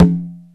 CONGA SET; OMI - Universe of Sounds

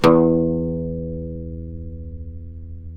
OUD E1 L, Turkish Oud

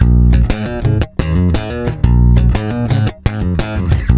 C, Bass; Spectrasonics; Bass Legends; 128 PWRSTRUM

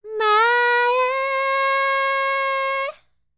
World Voices, INDIA; ILIO; Vocal Planet

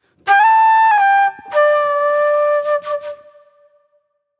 Acid Jazz Flute

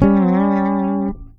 C LICK, PEDULLA BASS; Masterbits CLIMAX 7

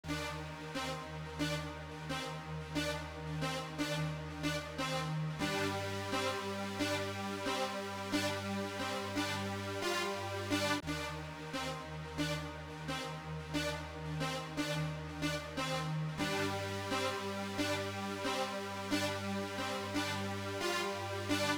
synth, Gmin, 089; Big Fish Audio; Legendary; How Can I

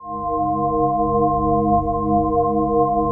PAD, DIGI-PADS; Best Service; XX Large Pads; Sample CDs

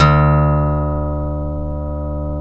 E, funk guitars; Spectrasonics; Hans Zimmer Guitars VOL-1; TAKA FUNK

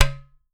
UTAR, Definitive Percussion

